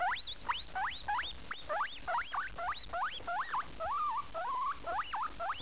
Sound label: squeak, guinea pig